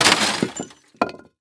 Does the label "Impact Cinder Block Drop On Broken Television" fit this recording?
Yes